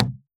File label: Moody Park Playground Perc; Houston; percussion